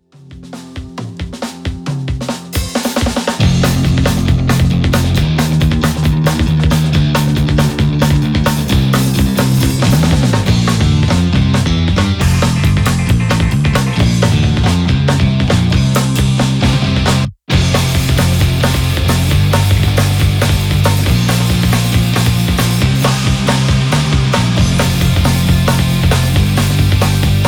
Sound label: Pick Alusonic Django Standard 4 Matte Black